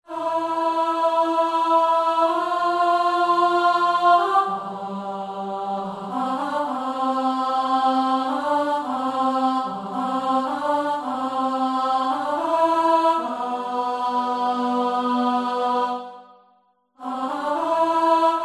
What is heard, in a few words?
Altos